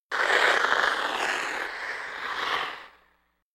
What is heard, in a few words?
Hockey Player Skate